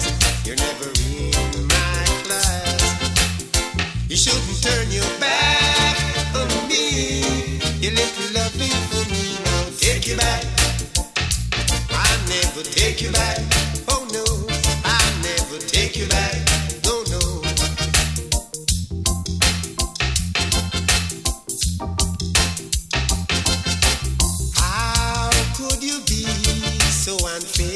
reggae

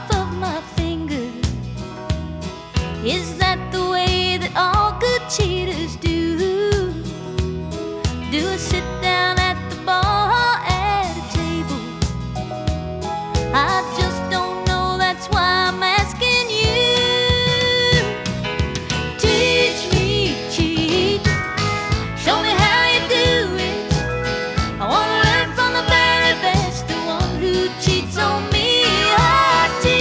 country

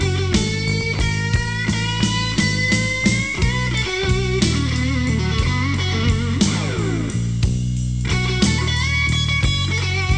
blues

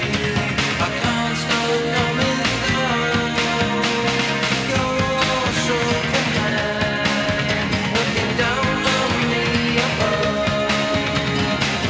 rock

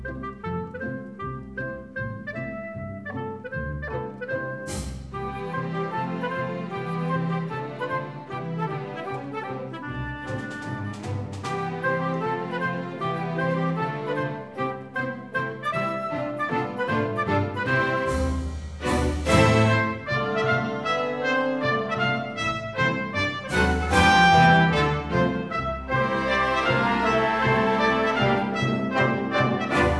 classical

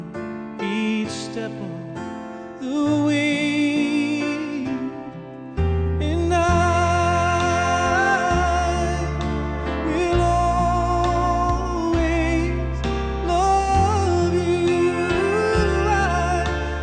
country

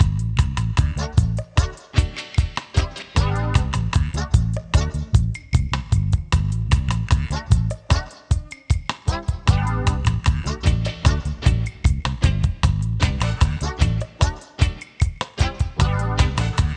reggae

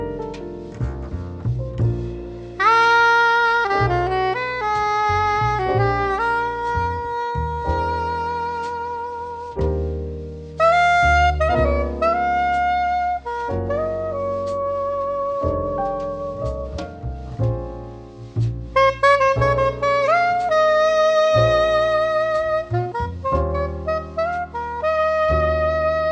jazz

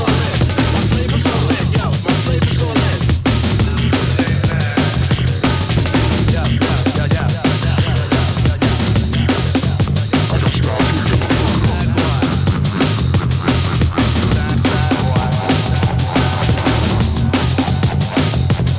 hiphop

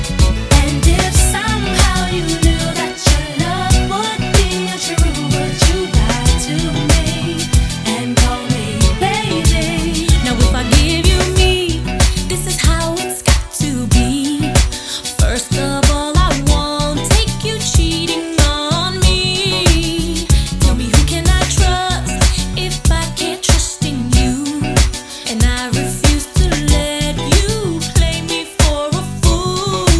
pop

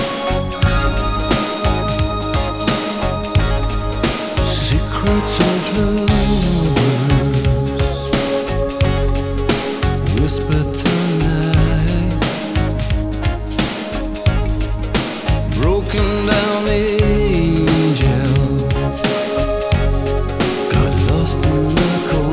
rock

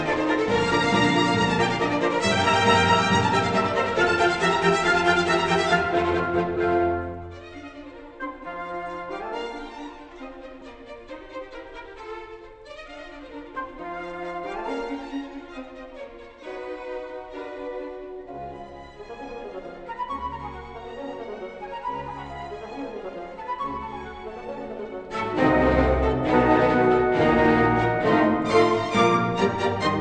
classical

